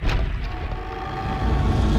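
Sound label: Music Volcano 120; from dust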